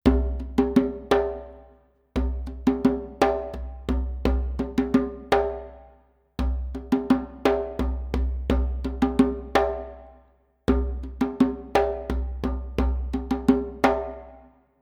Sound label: Rehearsal Drumming, Grasslands Chant